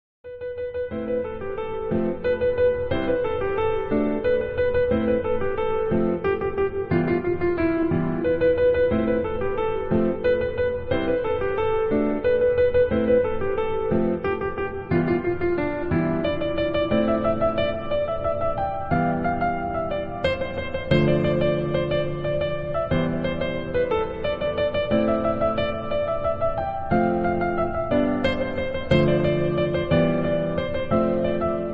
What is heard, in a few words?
instrumental ringtone